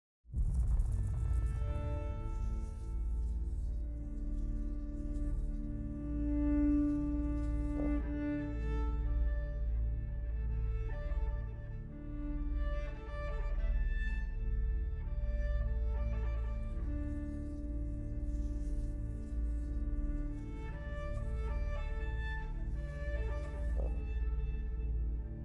The Winding Path Of Adventure Underscore, Classical Cinematic